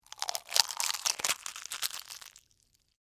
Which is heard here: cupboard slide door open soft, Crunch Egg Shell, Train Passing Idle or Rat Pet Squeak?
Crunch Egg Shell